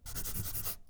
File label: Writing; ArmyRaid; Interface and Item Sounds